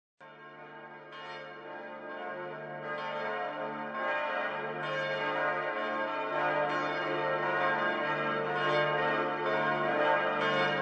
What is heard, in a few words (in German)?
Glocken